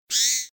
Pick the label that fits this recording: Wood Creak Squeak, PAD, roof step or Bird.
Bird